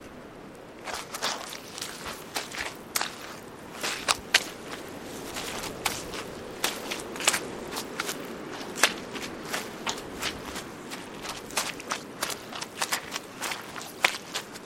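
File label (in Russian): шагая по грязи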